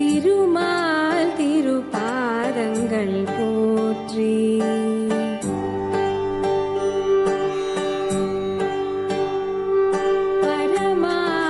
tamil devotional song

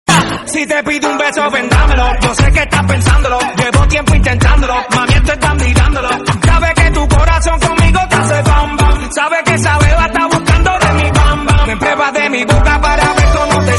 remix bgm

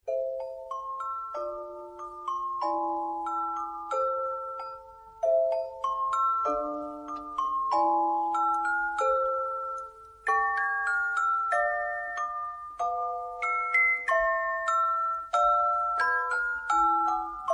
sweet alarm clock

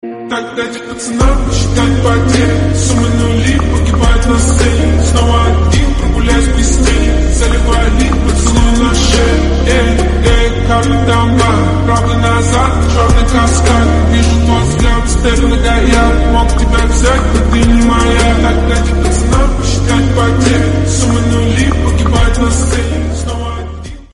russian song

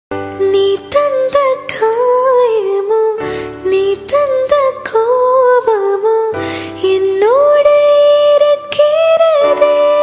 female version

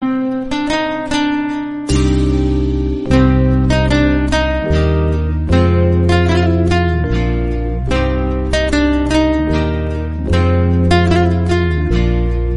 instrumental bgm

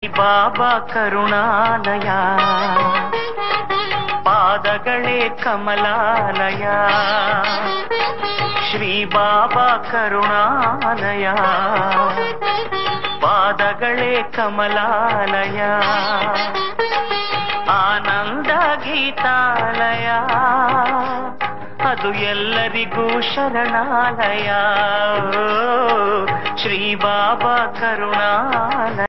kannada devotional song

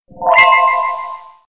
chat message

